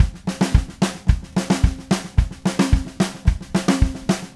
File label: Red Maple Groove 110, DW Red Maple Kit